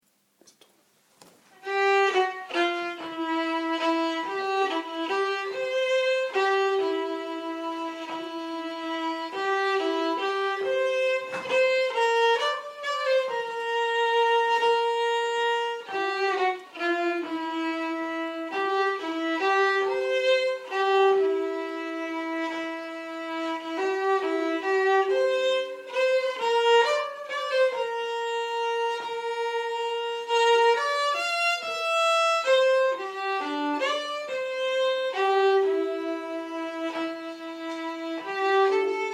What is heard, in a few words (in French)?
voix 2, violons; J avais promis